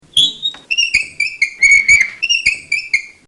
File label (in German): kreidequietschen; tafel und kreide